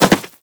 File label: Dirt Jump